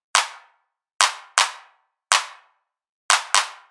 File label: 122 BPM Claps; mmb studio melodic